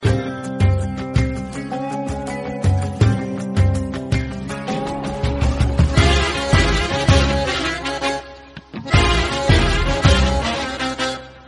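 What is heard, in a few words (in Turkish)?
Türk drama zil sesi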